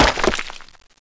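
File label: concrete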